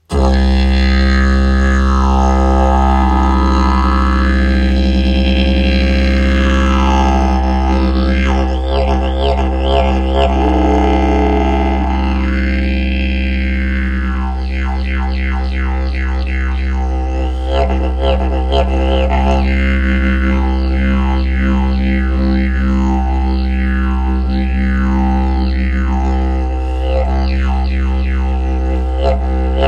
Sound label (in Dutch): Didgeridoo Geluid